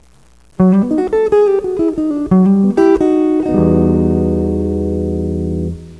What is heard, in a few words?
Jazz Mood